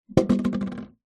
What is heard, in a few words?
plastic bottle empty knock over